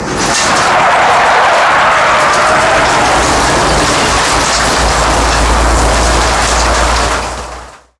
turn wheel, tanks; War Thunder

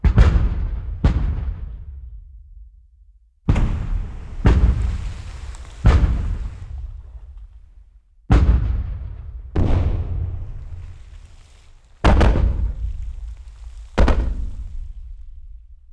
Amb Destruction

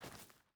footstep burlap stones walk; Rust

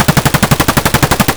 vityaz outdoor distant; loop; Escape from Tarkov

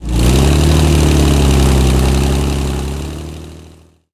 comet engine load, tanks; engines; War Thunder

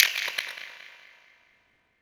lock radar end

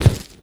grunt impact light

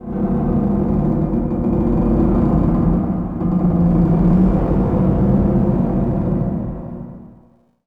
harbor occasional

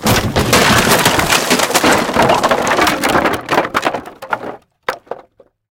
wood building fall small

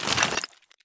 stop tile; Escape from Tarkov

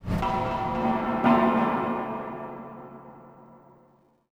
city occasional

